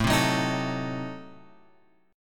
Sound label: A Diminished 7th A, chord shape; Adim7 Chord {5 3 4 X 4 5} strummed